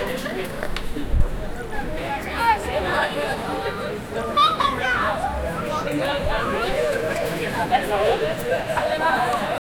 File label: subtle conversations and, bar